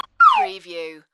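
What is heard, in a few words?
Slide Whistle Dive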